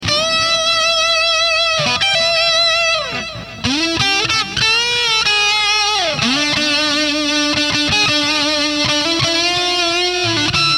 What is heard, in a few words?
Fuzz Face